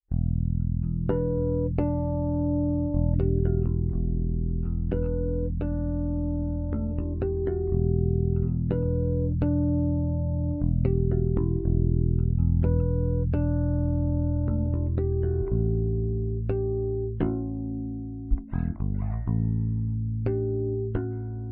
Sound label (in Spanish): Armonicos, Audio de GTO Series de 100W. con 6L6 a la salida. Canal Overdrive.; line out; Yamaha BBG4 A2 Activo; Yamaha BBG4 A2 Activo. Boss Loop Station RC2 en algunas bases.